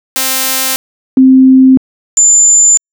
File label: Synth C4 25 partials IIR